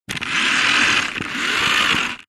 science fiction robot move tracks roll floor short; zapsplat